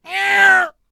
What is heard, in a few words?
Attack Vocals; Tempest Lich